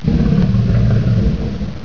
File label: GROWL; PoolDisk Too